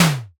impact tight

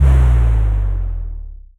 boom cinematic

